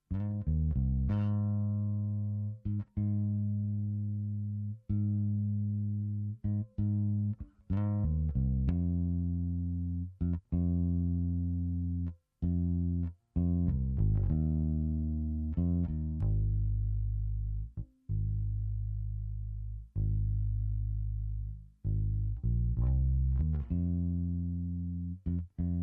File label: Bass Clean